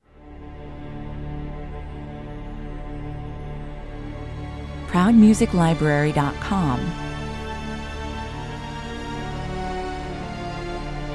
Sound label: epic 107 bpm